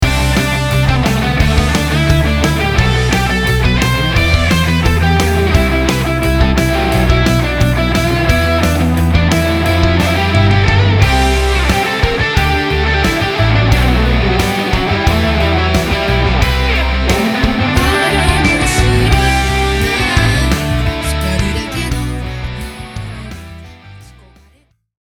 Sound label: BPM89